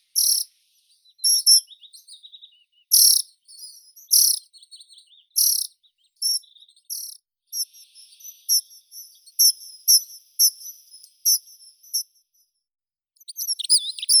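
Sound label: dunnock, Prunella modularis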